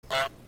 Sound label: goose, honking goose